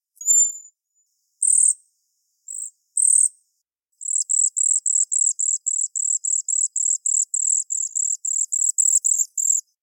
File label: Cedar Waxwing